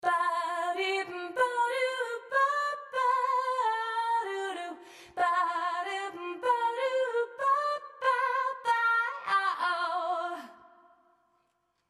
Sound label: Vocals Chamber Sound; Tverb; Preset: Chamber Sound